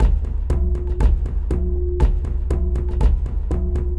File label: Drum Racks; Circle Of Truth Kit; Ableton; Factory Packs; Mood Reel